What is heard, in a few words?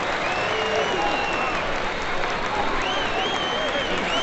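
Boxing Crowd Large Cheer Loop; Crowds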